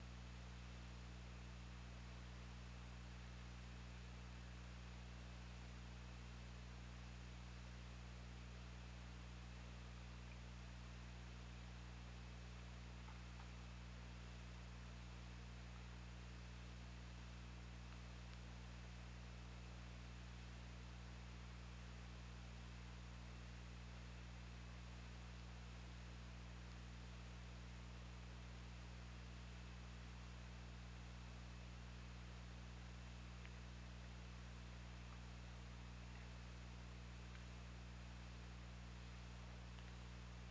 Orca; OrcaLab

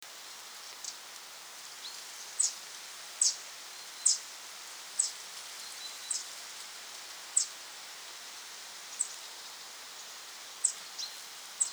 Troglodytes troglodytes; SETE

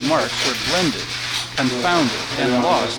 noisy

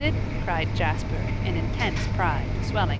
noisy

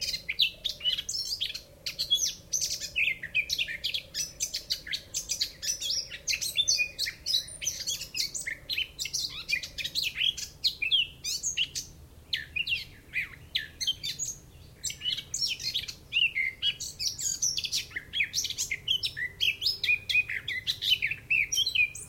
Sylvia atricapilla; SETE

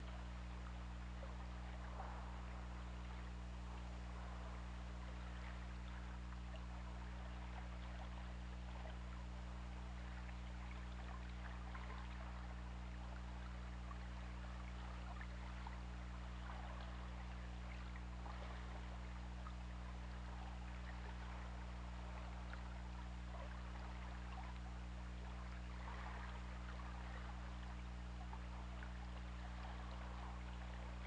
Orca; OrcaLab

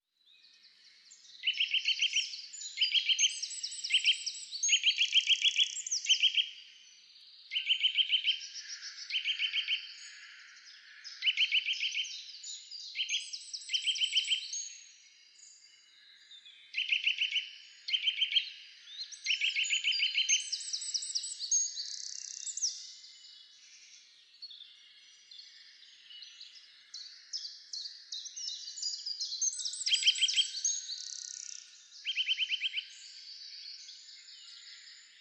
Sitta europaea; SETE

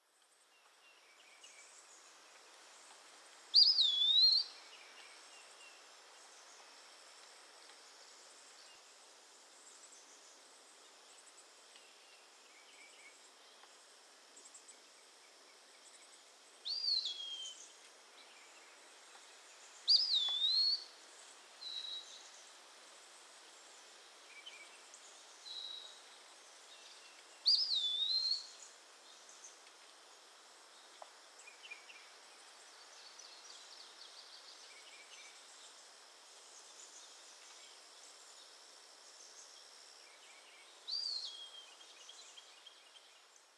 SONG - Eastern Wood Pewee - Contopus virens

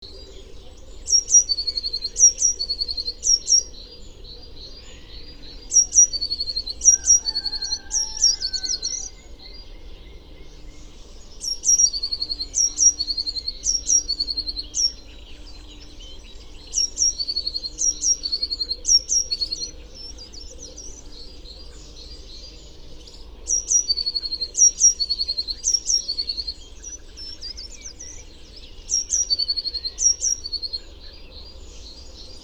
Cyanistes caeruleus; SETE

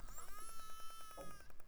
call

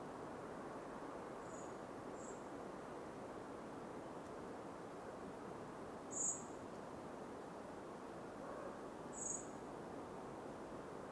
Turdus merula; SETE

